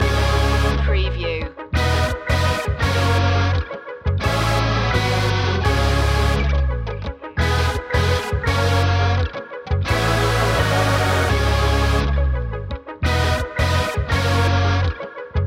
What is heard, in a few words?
groove loop